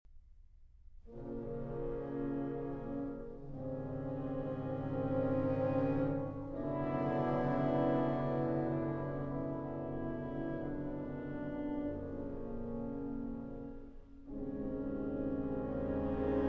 Concerto For Piano and Orchestra, Live at Symphony Hall; Symphony Hall, Boston 2019